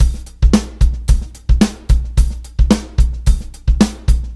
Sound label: Live Beats, R&B Drums